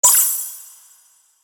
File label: Christmas game menu ui confirm